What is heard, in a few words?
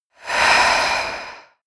female breath medium out